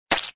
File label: shot